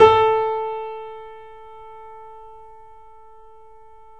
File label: BPM L3 A, The Piano; InVision Interactive